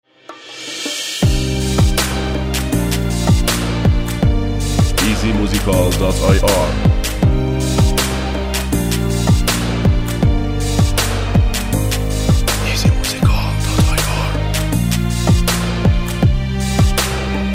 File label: Beat